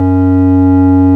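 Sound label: ORGAN Spy F#2, Farfisa Combo; Keyboards of The 60's and 70's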